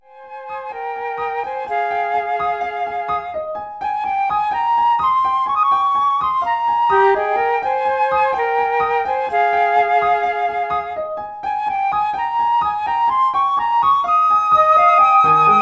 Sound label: flute duet